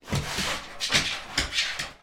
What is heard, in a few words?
dog labrador walk slide wood floor